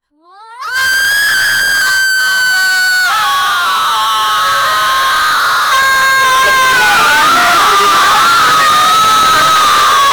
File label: female crowd panic